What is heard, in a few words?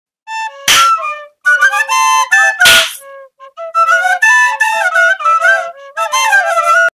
default dance recorder cover; fortnite